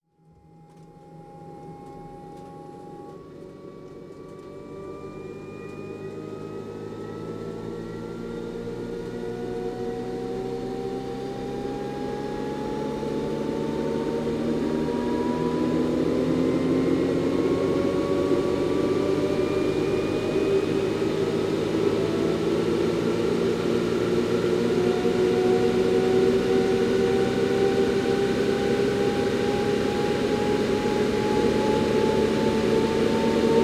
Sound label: BMW i8 electric motor